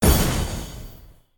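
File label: coin opened, slots; pork knox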